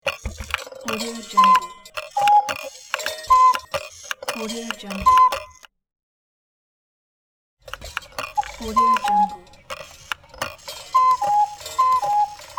broken cuckoo clock

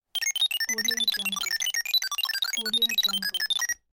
robot

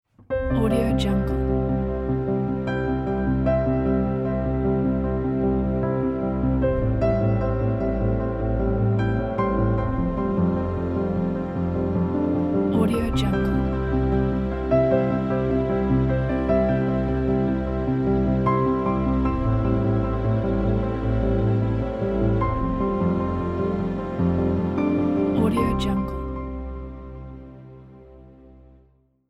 piano logo

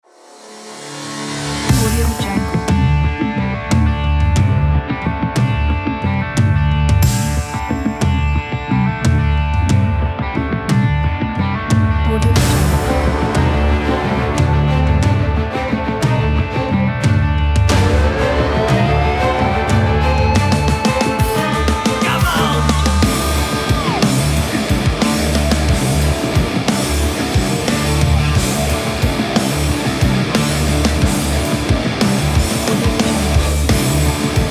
powerful rock sport; oystudio

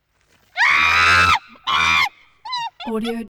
chimpanzee screams

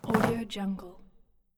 old hemp rope drop

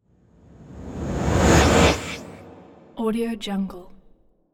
talking ghosts woosh; asylum

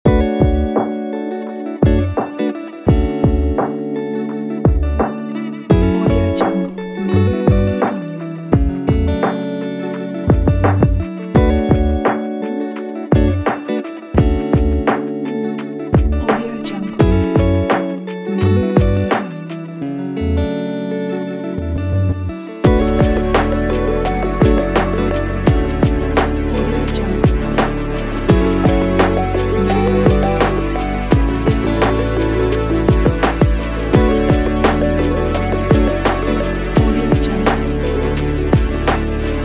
chill beat hiphop pop vlog

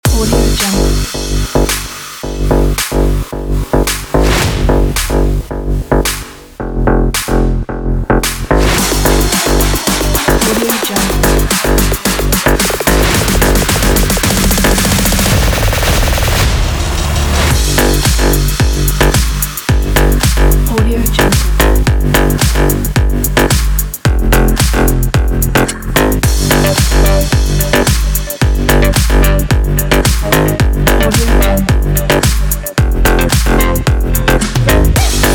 electro workout

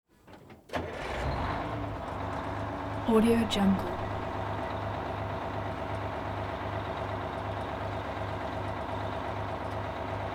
semi truck detroit diesel engine start idle

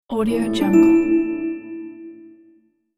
harp reveal

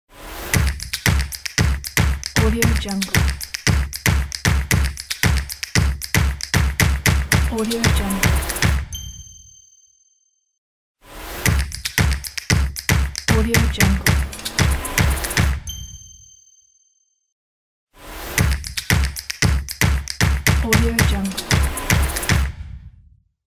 finger snap and claps logo

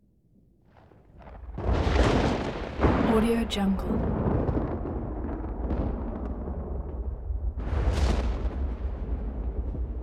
thunder explosive blasts

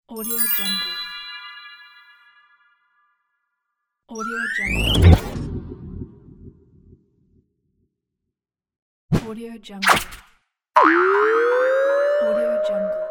cartoons sfx

